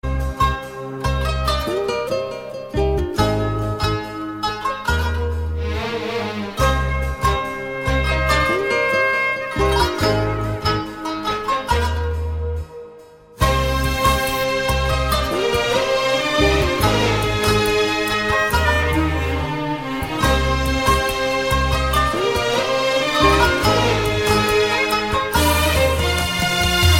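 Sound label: Arabic melody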